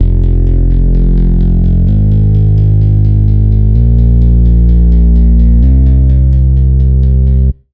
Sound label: Bass, Spire; Kuiper Belt; Routed Sound; Spire Expansion; Star Scavenger Vol.1